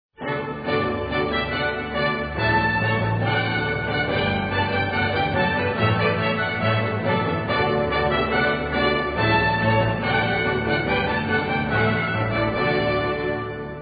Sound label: prelude